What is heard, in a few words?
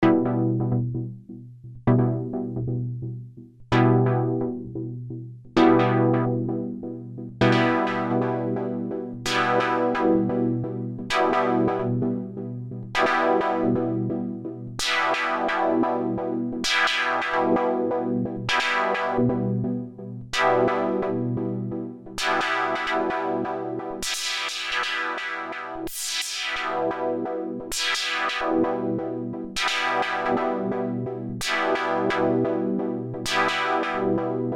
PARAPHONIC TECHNO STABS; Redshift 6